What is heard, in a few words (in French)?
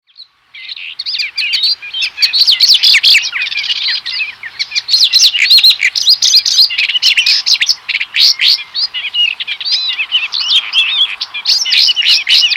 rousserolle isabelle, chant